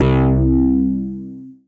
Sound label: Bass, Retro Synths; CZ; Toog; Ableton; Factory Packs; Phazeform Vol 1